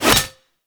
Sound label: Fishing Spear Line Retract Catch; Warframe; Eidolon Fishing Spears